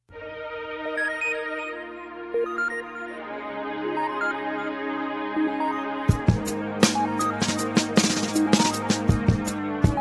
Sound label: blackberry tone ringtone